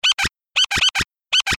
comical cute

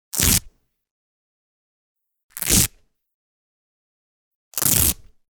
velcro

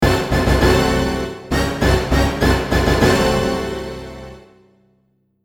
minor fanfare, jingle

